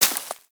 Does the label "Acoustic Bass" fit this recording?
No